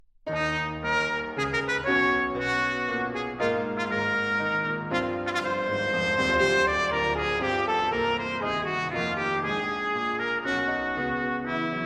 Sonata For Trumpet & Piano - Mvt. 1. With Strength & Vigor